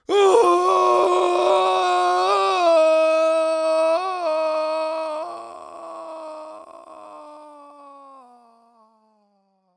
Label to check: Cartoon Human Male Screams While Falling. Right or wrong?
Right